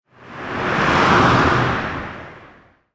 車通行音-短

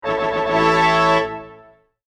ジャジャーン

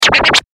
スクラッチ

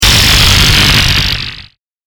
電撃 魔法

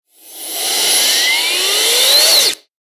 近未来動作音

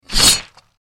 斬撃

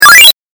8bit 取得

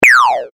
ショット

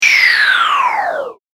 下降

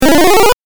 8bit 上昇

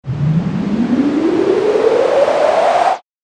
強風

